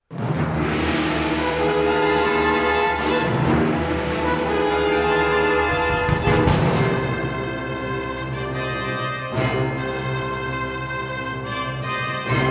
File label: Fanfare